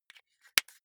marker close lid

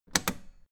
old button harsh ringing push

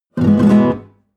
nylon guitar chord full major flamenco short

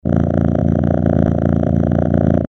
cat purring

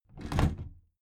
big plastic case lid put down soft

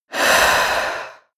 female breath medium out

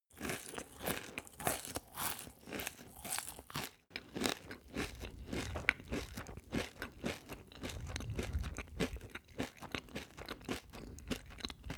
male close eat cereals

